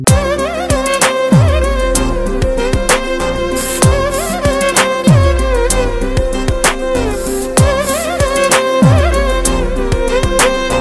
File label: BG Violin Tone